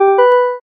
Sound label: alert warm